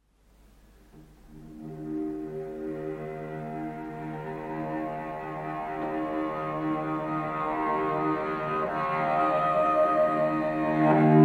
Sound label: Cello Drone